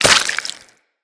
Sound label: Bleeding; Mods; Proc